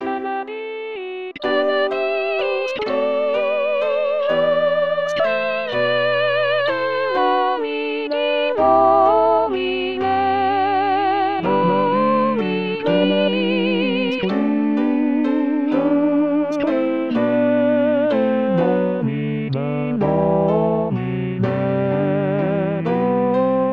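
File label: Benedictus All, rehearsal tracks christmas; Corp Christmas Mass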